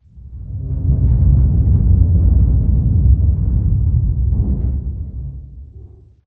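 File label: Giant Pillar Close Rotate low frequency effects; Titanfall 2; Boomtown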